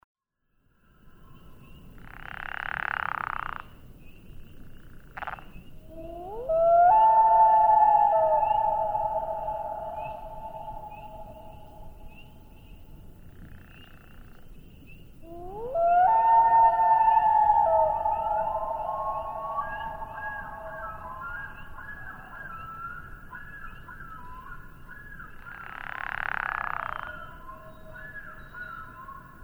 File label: Common Loon